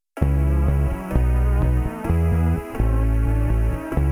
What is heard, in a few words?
Synths